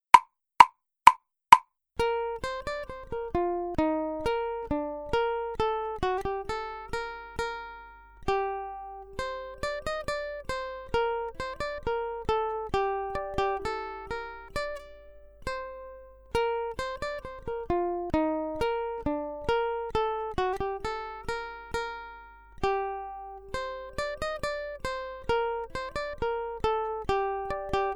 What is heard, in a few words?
Accompaniment, ukulele; grade7